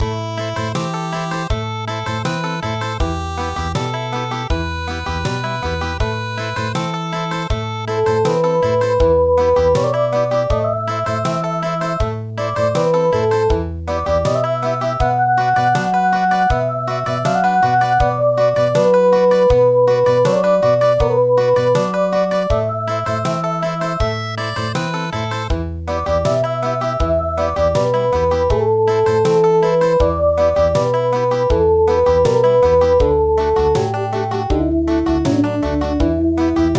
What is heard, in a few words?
Country; Choshi Tairyou Bushi